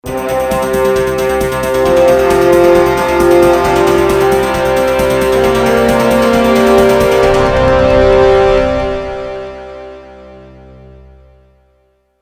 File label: Hornblower News Jingle; Jingles for films podcasts